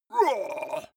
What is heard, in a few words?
male fight moan